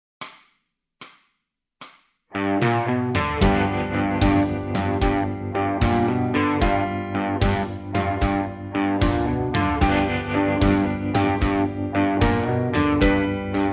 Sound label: bass blues, backing tracks